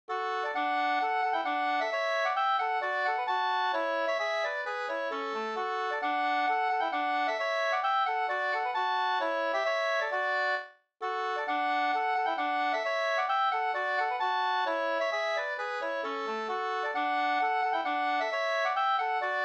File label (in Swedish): polska; e Wahlstrom Dunker Sd Leffler